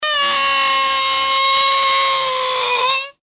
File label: ballon squeek